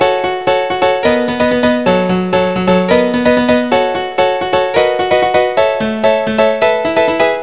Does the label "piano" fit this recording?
Yes